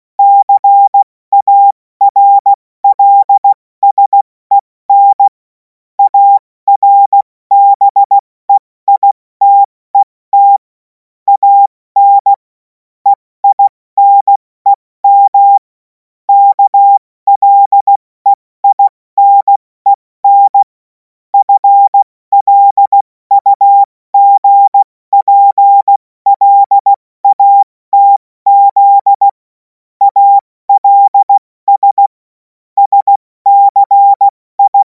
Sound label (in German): Witz, Morsekurs, cw morsen; Tempo 70 F80; hamradio; cw witze